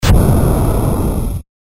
炎魔法 中; モノラル; 魔法効果音

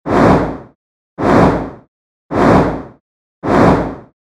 モンスターの羽ばたき 4回 遅め

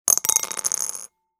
チンチロ サイコロを振る 小丼

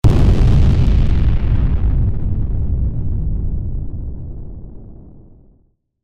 爆発; 武器効果音

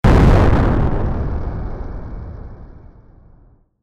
爆発

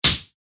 ムチがぶつかる 通常攻撃; モノラル; 武器効果音

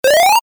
アイテム出現 速め; モノラル

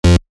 ブッ 不正解

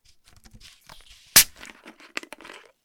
ペットボトルを開ける音

